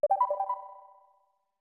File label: Cancel; RPG Maker; SNES